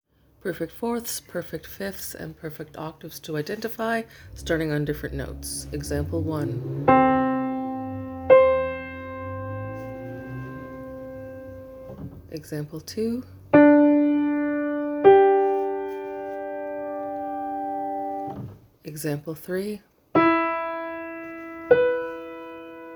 Perfect 4th, 5th and Octaves for identification preceded by song example; Interval Identification